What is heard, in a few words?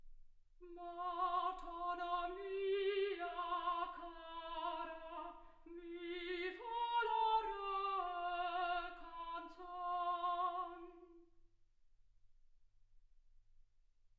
soprano